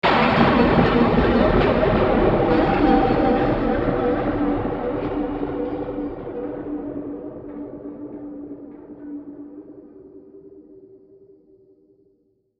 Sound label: Hit Crash Warble, Hit Crash Warble Reverb Dark